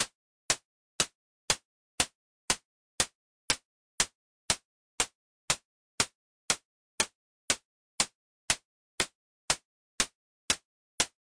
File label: percussion